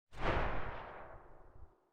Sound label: silencer dvl10 far